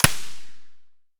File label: svd silencer mono distant